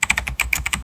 a quick satisfying smooth, keyboard

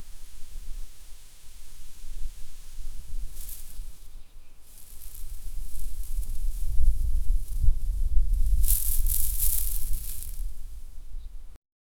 sand wind sand particles, desert

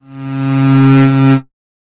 car; mad max inteceptor car; post apocalyptic

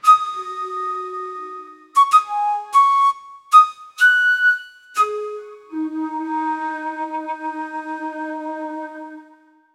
soft and regal instrument, javanese

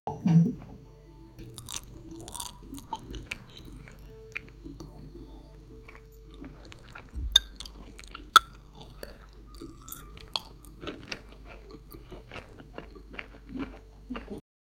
positive background sound, cheerful

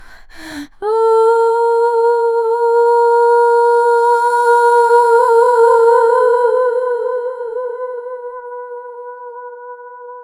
female singing with slow; female voice

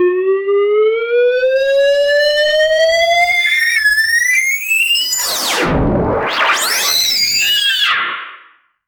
a fast tempo synthesizer, christmas

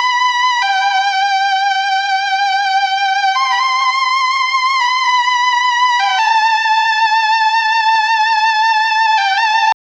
k pop type, kpop

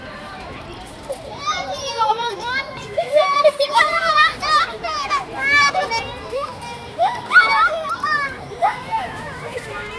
kids and goblins pla, park

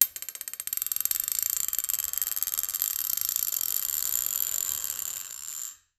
casino roulette wheel

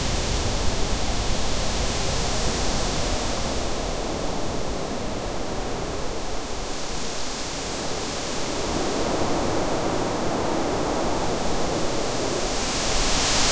the wind blowing the leav

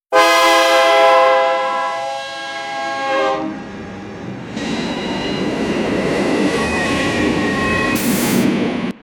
create sound of a train, crashes

